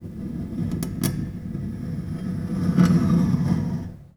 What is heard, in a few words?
String Scrape, Piano; Piano Miscellaneous